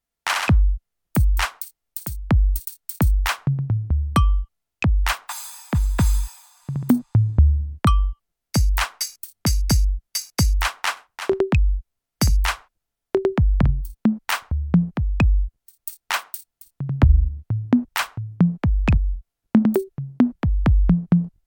Millenium MPS 750 E Sounds

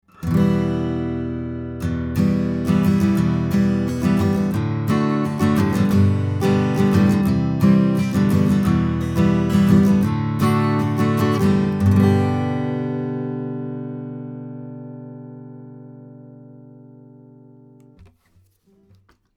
Acoustic Git OC818 Saturation Drive10

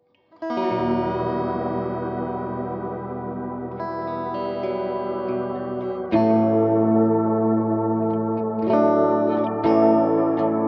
Preset Blue

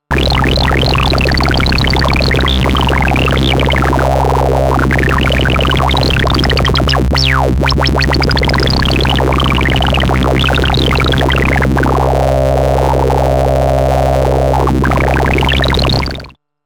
Waverazor Dual Oscillator FM Sine Buzz CV Mod; MOK

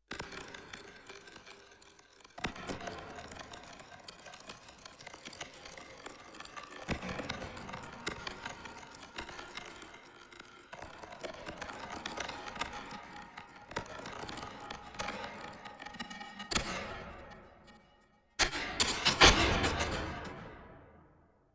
Reface DX

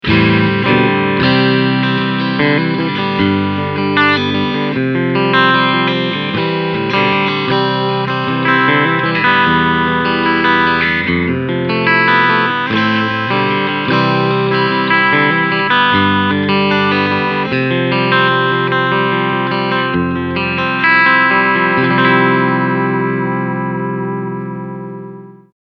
Cleansound

